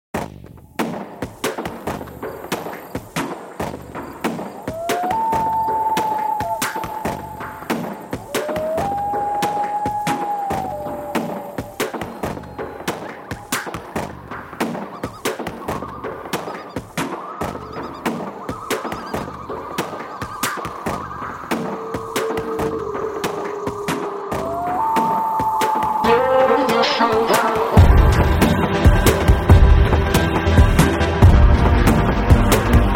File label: DRUM GLOW